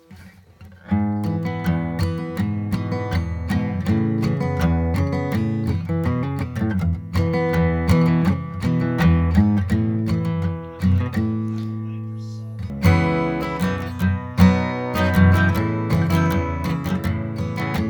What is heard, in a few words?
Song idea gentle